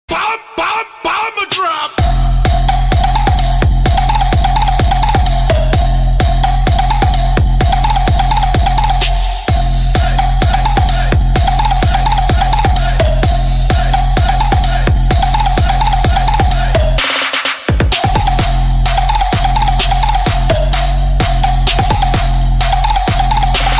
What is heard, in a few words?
trance electro ring tone